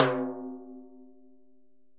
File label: Timbales A L Rim, Latin Percussion, Drum Hits; Ableton; Factory Packs